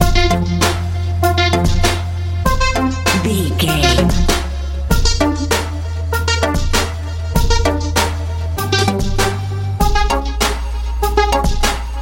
euro dance

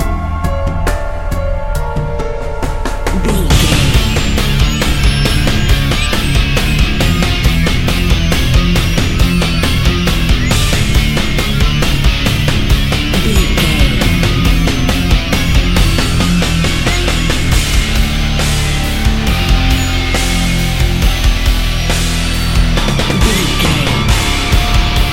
twisted scraps metal

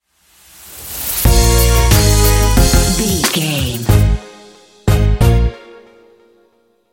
belly swag stinger

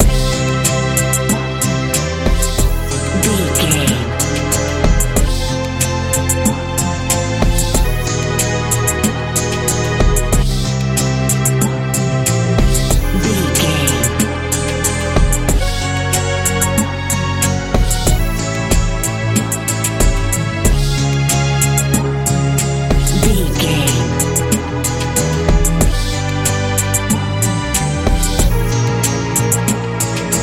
modern pop

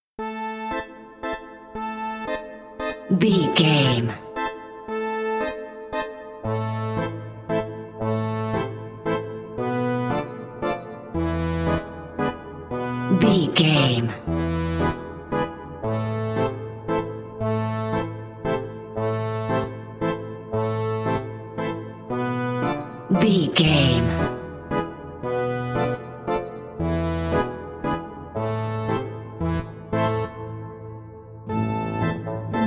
haunted carousel narrative

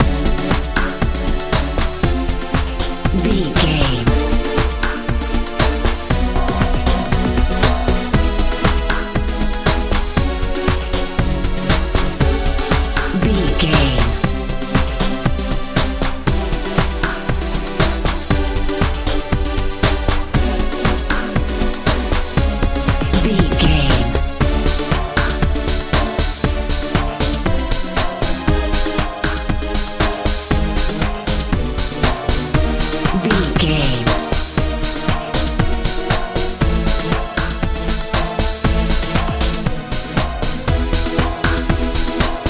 sunny good modern dance

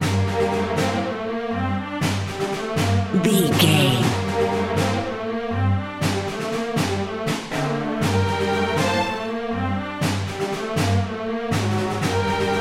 invader game loop

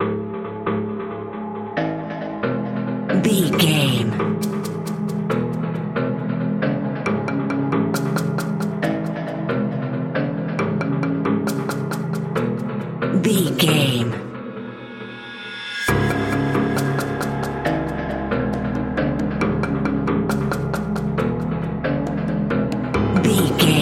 wilderness tension

